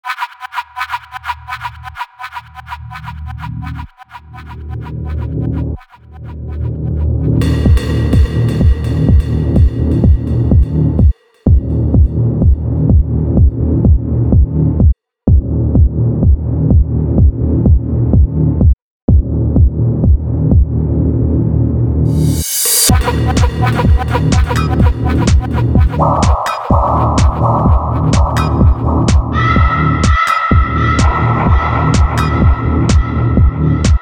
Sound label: dark tech house